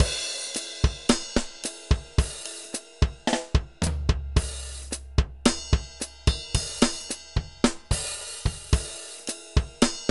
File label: Slow Groove